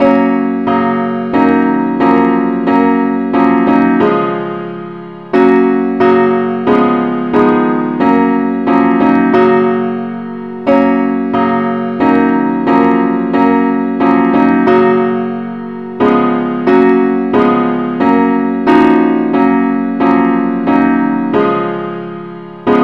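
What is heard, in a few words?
henley advance accompaniment keyboard; key fis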